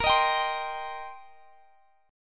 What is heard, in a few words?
C Minor Bb; Cm/Bb strummed